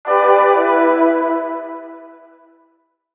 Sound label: Warm Brass, door chimes; premium portable